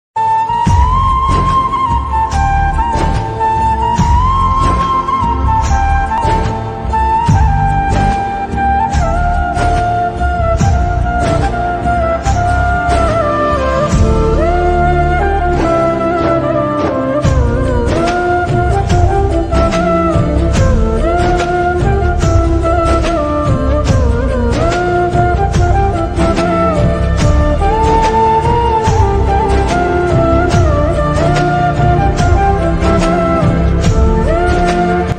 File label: Flute Ringtone Slowed Reverb